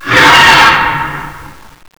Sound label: frenzy, horror; slender